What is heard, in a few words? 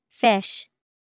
fish